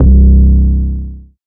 808 rattle C, Tonal; Hip-Hop; Slate; CAICOS Hip-Hop Sample Pack

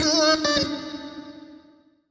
Omnivox oneshot Eb, Vocal; One Shots; Slate Digital Omnivox Vocal Sample Pack

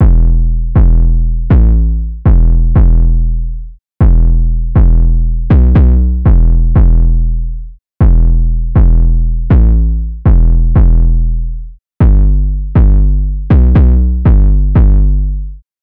808 loop 120 Em; Slate Digital BASSHOLE Sample Pack; 808 Loops

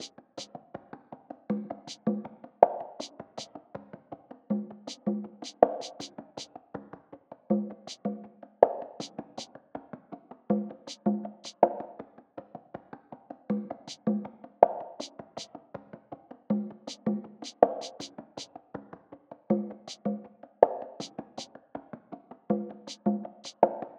perc 80; Drum Sample Pack; Loops; SD SEISMIC; Slate

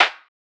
snare sticky; one shots; SEISMIC Drum Sample Pack; Slate

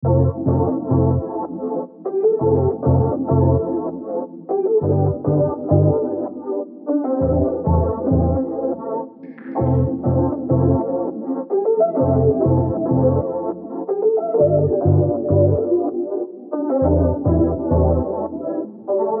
songstarter 100 Am, Pop; Slate; SD PASADENA Pop Sample Pack

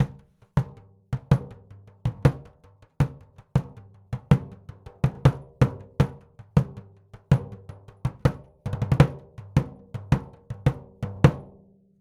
daf loop 80

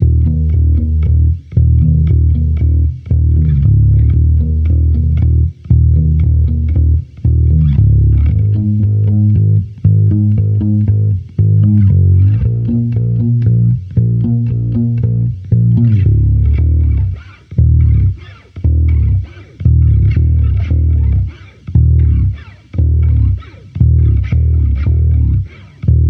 Amped loop 116 Ebm, Electric Bass Loops; Slate Digital BASSHOLE Sample Pack

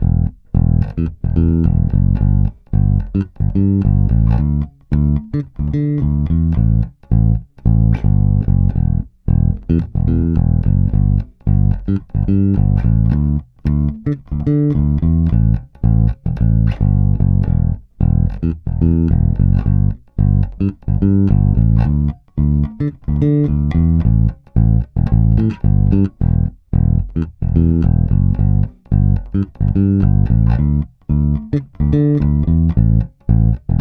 Bass loop 110 Em, Electric Bass; Electric Bass Loops; Slate Digital BASSHOLE Sample Pack

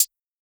hat mid, Closed Hat; Hip-Hop; Drum One Shots; SD CAICOS Hip-Hop Sample Pack; Slate

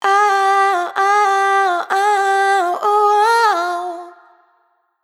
riff Ah Wah 128 Fm wet, Vocal; Slate Digital Omnivox Vocal Sample Pack

